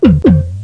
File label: tennis ball